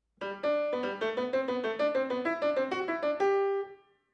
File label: Horn; Siegfrieds